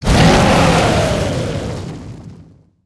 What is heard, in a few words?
Fire Breathing; creatures organic